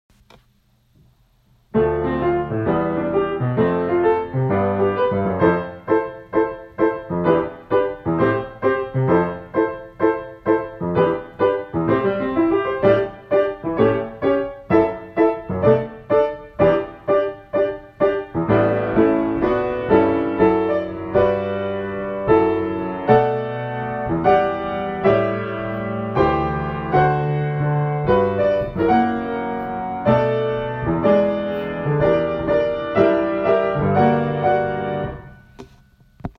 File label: bass audition accompaniment at tempo